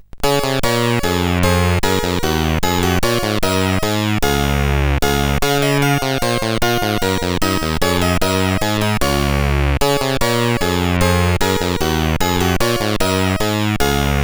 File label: Bach CSG8580R5